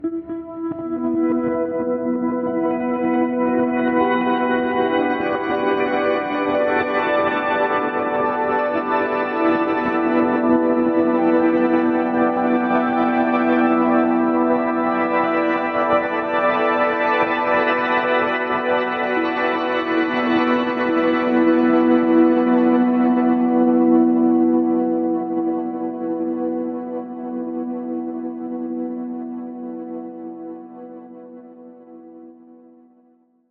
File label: Granular Fifths